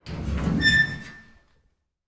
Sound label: door open workshop; aino; anemoi